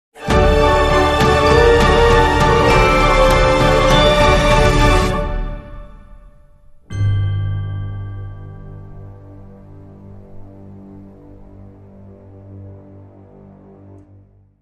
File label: Long Sting